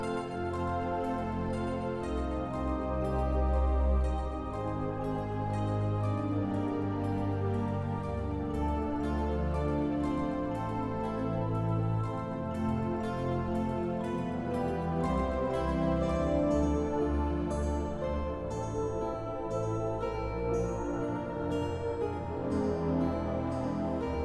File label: Stinger End Of Game Loop; Faeria